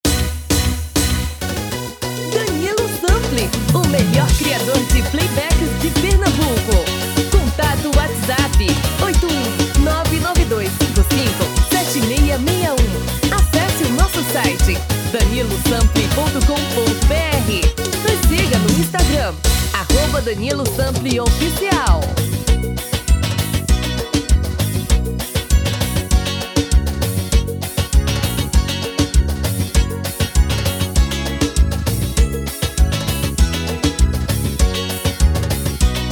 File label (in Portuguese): AXE tom feminino